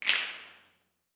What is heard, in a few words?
SNAPS; Vocal Planet Vol1 Gospel; CLAPS+SNAP